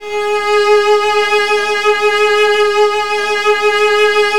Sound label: Virtuoso Strings G#2; ILIO; Kirk Hunter Virtuoso Strings VOL-2